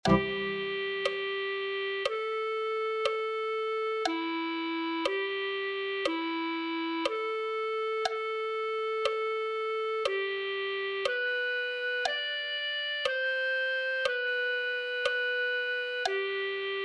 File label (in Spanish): clarinete